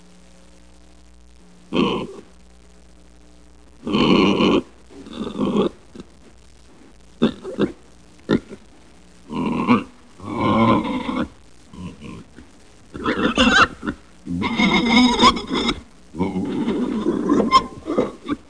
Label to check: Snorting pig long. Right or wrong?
Right